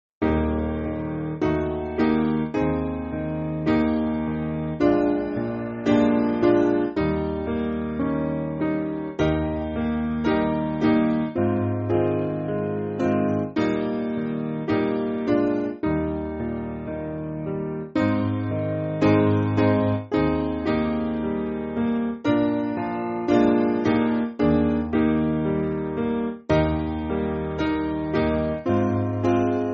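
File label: Piano, Music Backing Files for Churches; Like As A Father; Beldon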